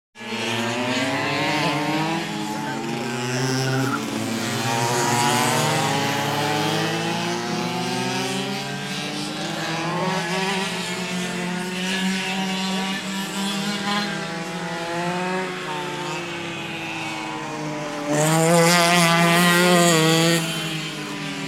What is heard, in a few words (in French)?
Karting